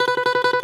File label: BALALAIKA, Prima Balalaika, Plucked Strings